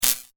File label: Electric Spark; Electrical; Sound Design; McKinney Sound